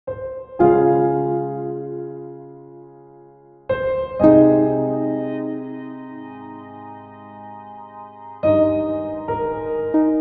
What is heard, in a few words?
loop